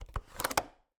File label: Cabin, window, latch, flip, lock, close, wide; Eiravaein Works; Vaeyan IV